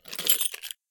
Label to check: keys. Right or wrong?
Right